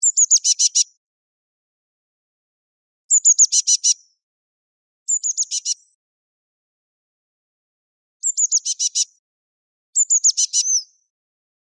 Pimpelmees; Wageningen

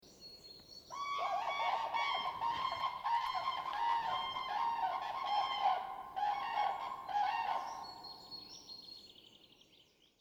Kraanvogel Grus grus

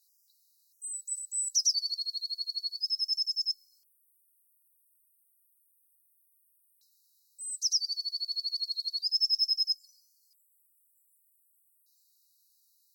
pimpelmees afwijkende strofenzang

